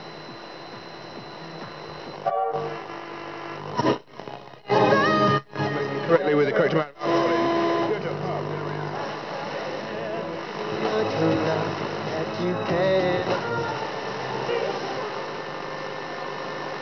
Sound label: radio